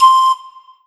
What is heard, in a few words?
Korg TR Rack Pan Flute C6